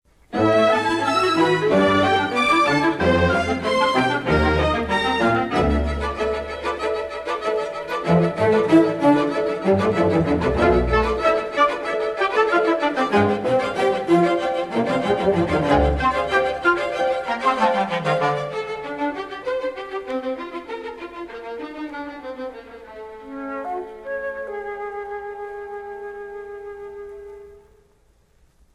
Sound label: Light Mechanical, Cartoon Music; Cartoon Music Discs